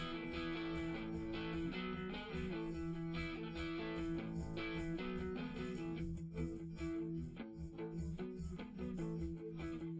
drums live rec 250ms 1m; SiSEC11; underdetermined

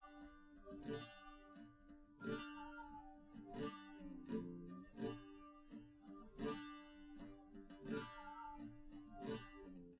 no drums, live rec; SiSEC11; underdetermined

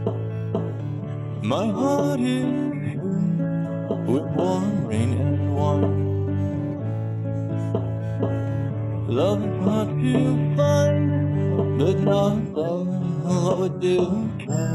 guitar; SiSEC11

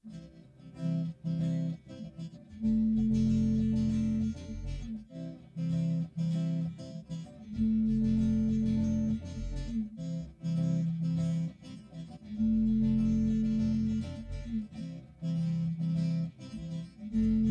guitar

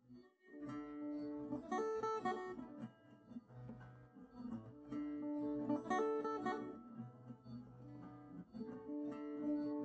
no drums live rec 250 ms 5 cm

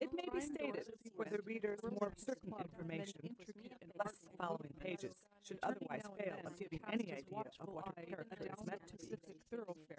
female inst sim; SASSEC07

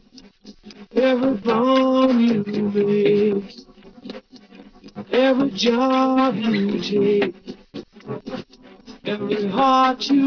voice

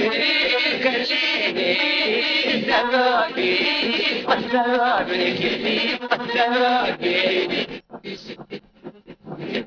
voice

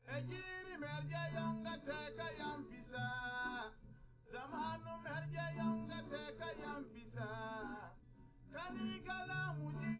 nodrums liverec; underdetermined; SiSEC11

